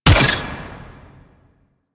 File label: Infested Burst Rifle Shot; Warframe